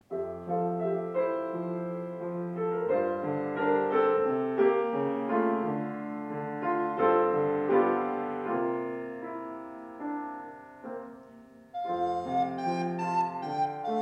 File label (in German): Floeten